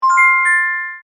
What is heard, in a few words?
Multimedia Chime, Technology, Multimedia, Chime; Chimes; BlastWave